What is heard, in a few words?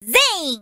jump; freak fortress 2; hat kid